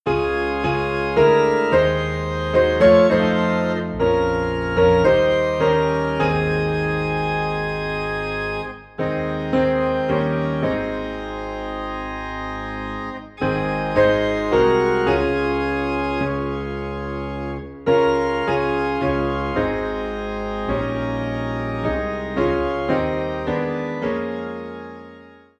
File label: Piano and Organ in A Flat